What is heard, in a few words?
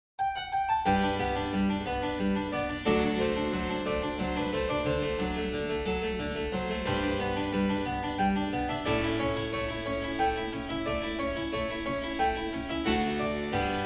Minuet Arranged for Harpsichord